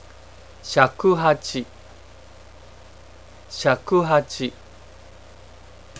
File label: shakuhachi; japanese